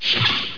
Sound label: Open